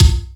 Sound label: BD, Yamaha RY-10, Drums